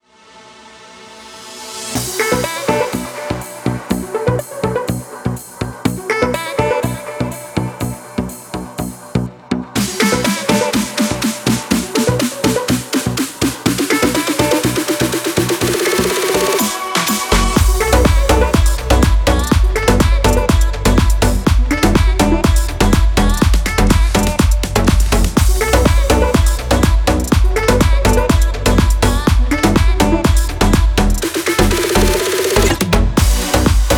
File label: house electronic techno; By Burak Sound Bank; house electronic techno vol 9